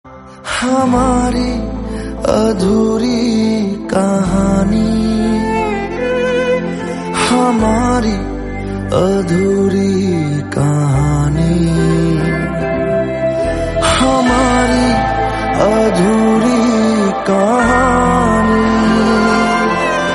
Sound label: background music